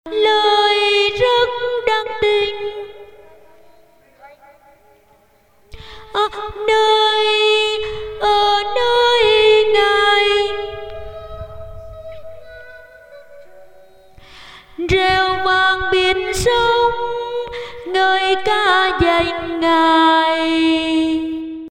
alto

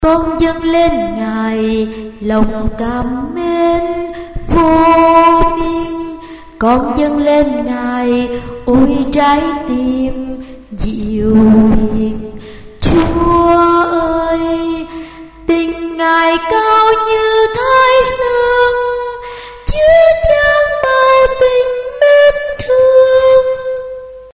tenor basso

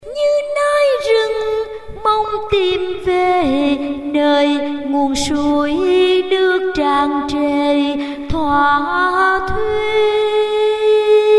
alto

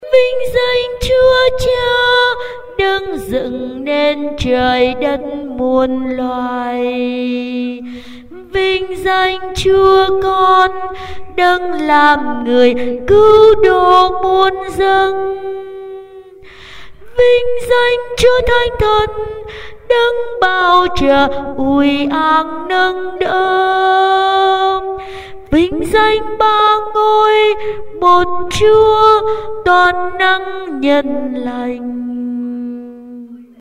basso alto

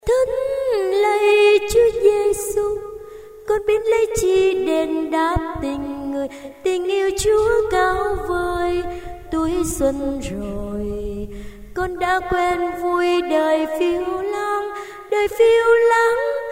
tenor

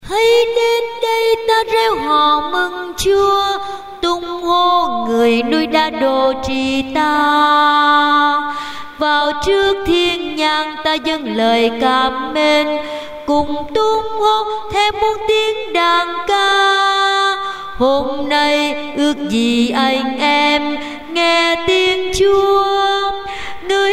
alto basso

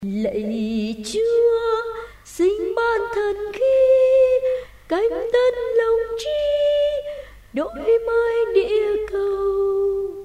tenor basso

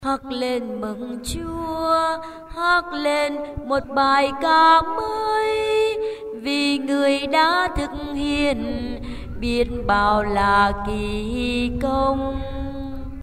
alto basso

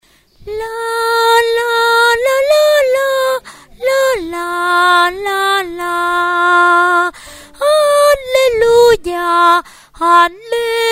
alto

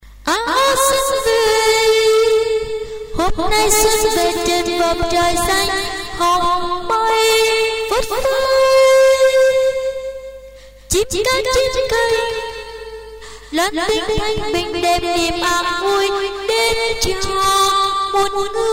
tenor

